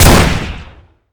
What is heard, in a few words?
shotty alt; balrog